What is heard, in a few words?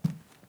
step carpet; OneShot Fading Memory